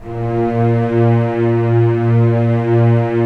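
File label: STR String Section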